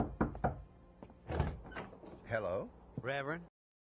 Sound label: doorknob